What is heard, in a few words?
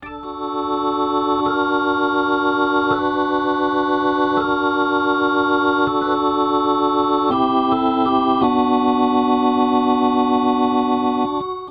Soul Organ Riff, 70s Analog Funk; Sonic Foundry (Sony Creative Software); What it Is!